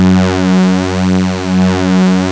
OB F#3, Rare Synthesizer; Hollywood Edge - Giorgio Moroder Rare Synthesizer Collection; OB 4 VOX

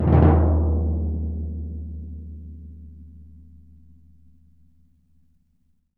TMP, Synclavier Percussion

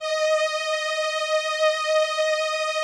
VP-330, String Machines

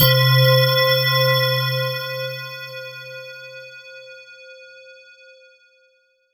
C2, Roland JV 2080, JUNGLE BRASS

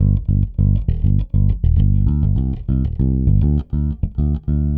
Pop, Bass; Sonic Foundry (Sony Creative Software); Bass X

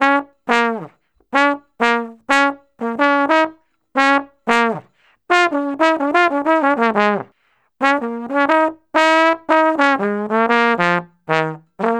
Trombone Solo, Jazz

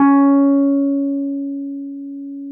Wurlitz EP C#; Sonic Reality - Vintage Timetraveler

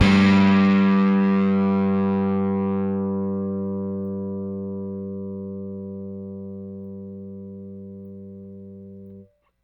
Rickenbacker Bass; Airwindows

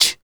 FEM PERC, Vocal Jazz FX

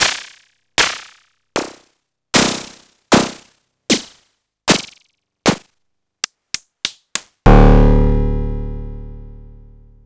Noise Engineering Basimilus Iteritas

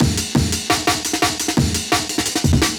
breaks; M8

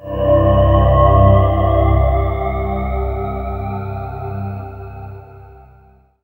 SYN Morp C3; Best Service Gigapack 1

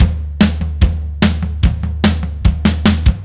Silos Beat Fill, Indie Rock; Sonic Foundry (Sony Creative Software); Indie Rock Anthems; Session 11 - Silos

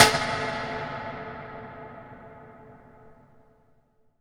IMP SNR DRUM, Drum, Cinematic Impact

